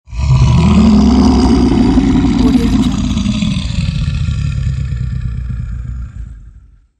bear creature growl

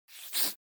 human nose sniff

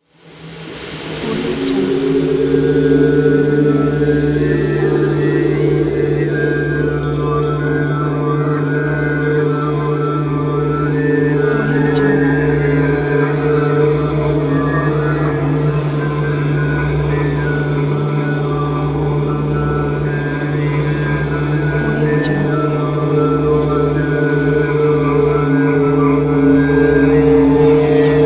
shaman spell rite meditation

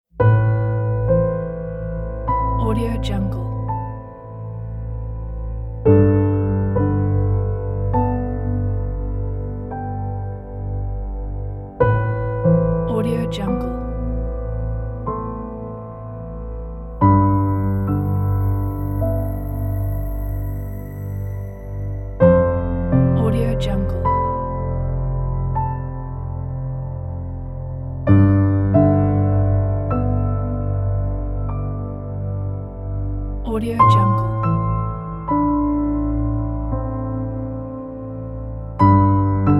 sad thoughtful piano

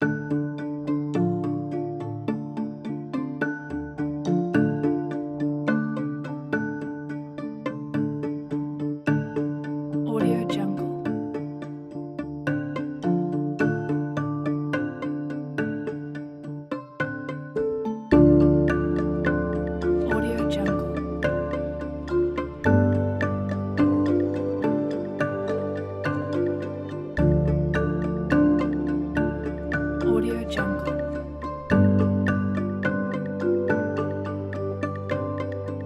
the documentaries

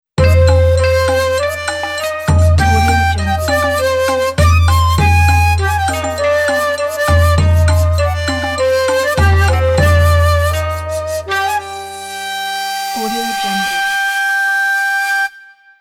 japan matsuri festival logo

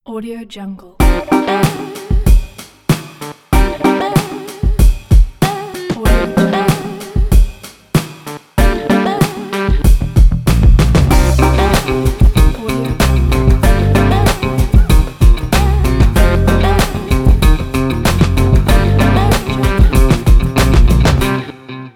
urban logo

